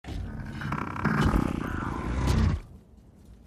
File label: Terminator sound